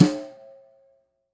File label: Steel Brush, Snares; Ghosthack - Free Snares 2023